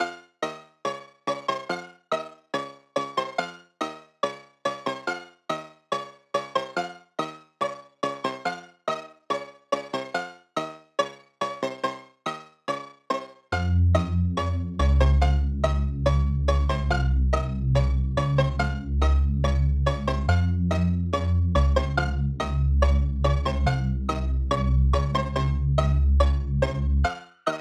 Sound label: Loop, 140 BPM